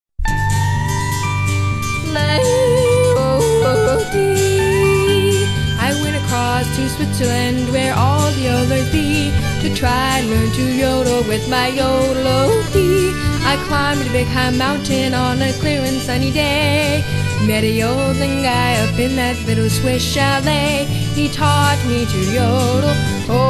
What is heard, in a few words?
Yodel